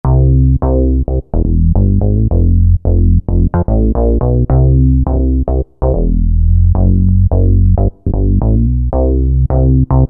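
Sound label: Boogie Bass, Roland V-Synth; Factory Patches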